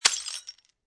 Glass Debris Smash, Impact, Glass Debris Smash, Crushing Broken Glass